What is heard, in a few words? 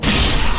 greatsword hit; Dark Souls III